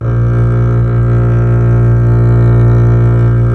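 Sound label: string contrabass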